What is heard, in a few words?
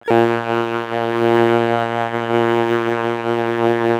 Bass drone A#, Circuitbend; Drones - Bass; 99Sounds; Mangling Audio